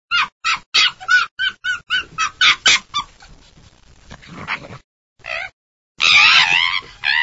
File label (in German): welpen, fuchslaute; fuechse